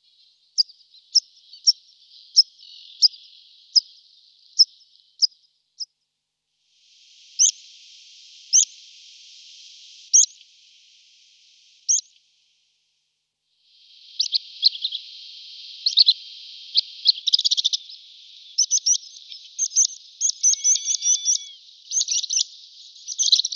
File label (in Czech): Linduska horska skalni Anthus spinoletta petrosus